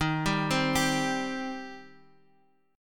C Minor Eb

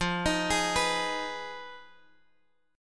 F Minor 7th Flat 5th Guitar Open D; down; chord shape

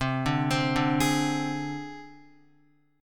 C Suspended 2nd Sharp 5th, down, Guitar; Csus2#5 arpeggiated; JGuitar

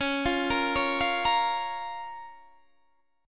Bb Minor Db

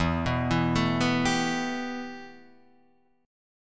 G 6th Add 9th E, chord

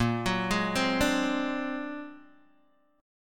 A sharp Augmented 9th A sharp Guitar DADGAD

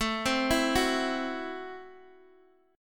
A Minor 6th A, Guitar, Open G

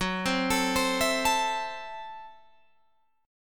F sharp Minor 7th Flat 5th F sharp Guitar Open G

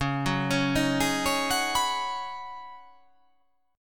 C sharp 9th Suspended 4th C sharp down, chord

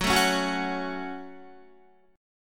Gb Minor Gb Guitar; strummed; JGuitar

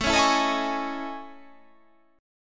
A Major Sharp 11th

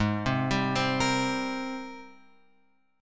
Gsharp Major 9th; G#M9 Chord {4 3 5 5 X 6} arpeggiated

